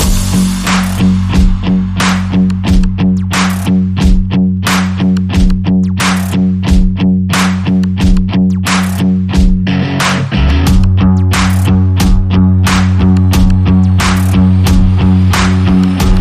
No Lead No Vox No Brass

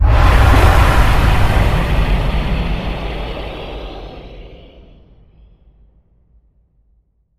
Dragon Fire Boom

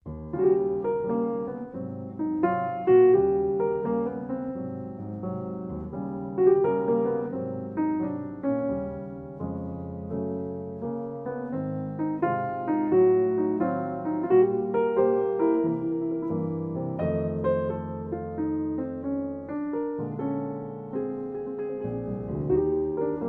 Solo Piano

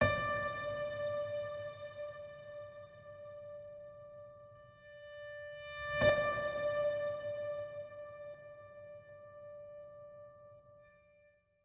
Just A Piano Note D, Alt High

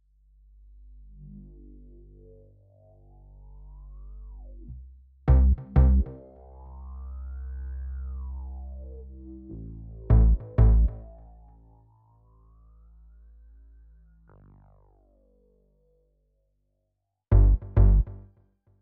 Bass

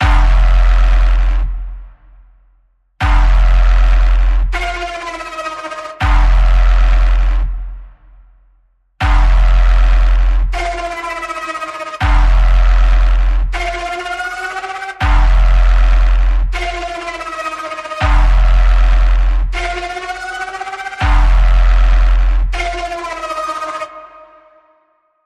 Mechanical Pulse F, Key of F

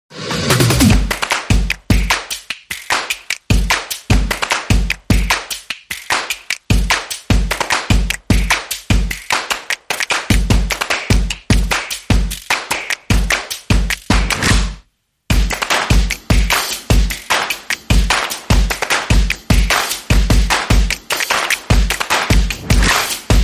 Percussion

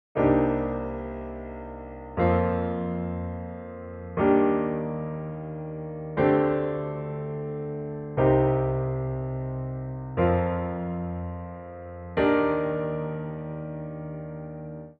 Piano

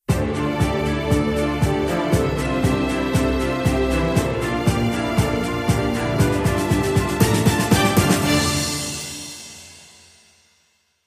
Battered Bass, Sting

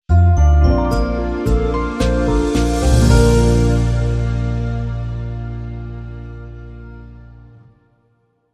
Long Sting

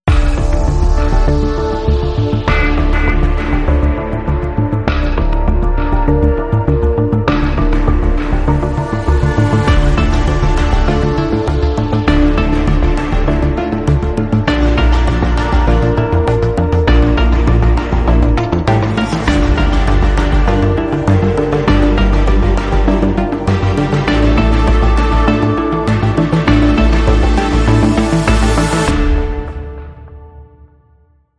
Emergency Landing Less Drums

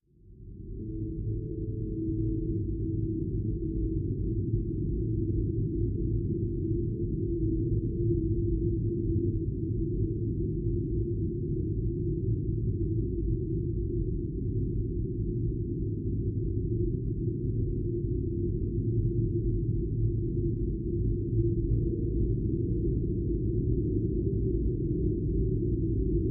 Drone

